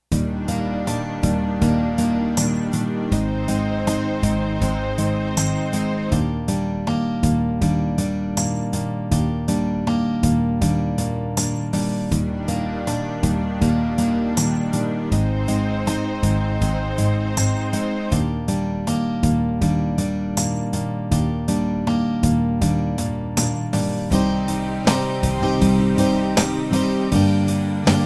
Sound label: 8Bt Standard